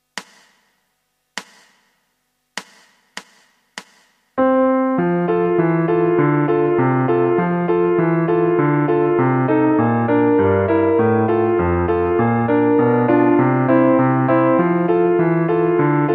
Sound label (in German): Marsch